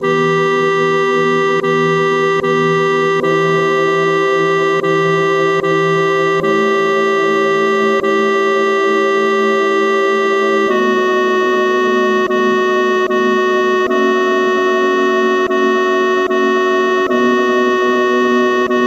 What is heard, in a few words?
Alto; Vivaldi GLORIA